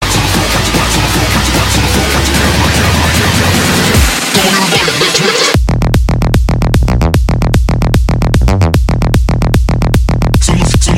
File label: House Ringtone